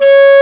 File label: CLARINET D#4